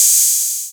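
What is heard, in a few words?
808 open hi hat; 808 SOFT; Wizoo - Platinum 24 Electronic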